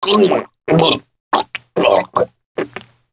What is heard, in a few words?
gargle